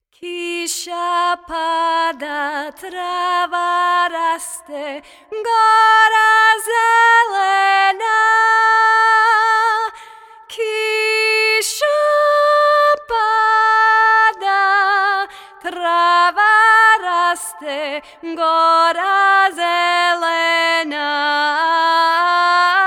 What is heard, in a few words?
Vocals Verse